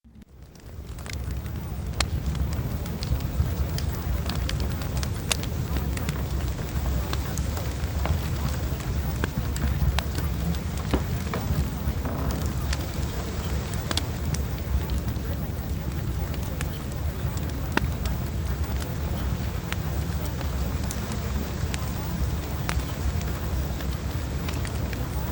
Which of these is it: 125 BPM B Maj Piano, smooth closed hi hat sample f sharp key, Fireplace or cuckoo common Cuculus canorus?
Fireplace